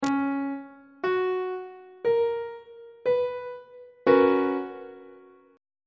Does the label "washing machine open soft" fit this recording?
No